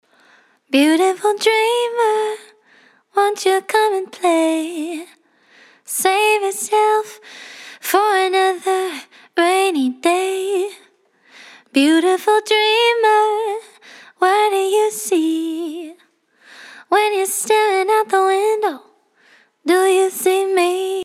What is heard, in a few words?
Vocal Dry